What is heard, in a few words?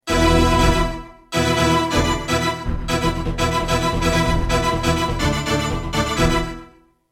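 RNB strings; vfx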